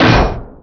drop metal

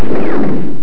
LASER

